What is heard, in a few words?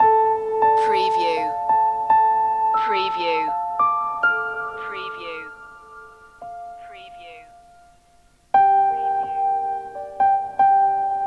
waltz loop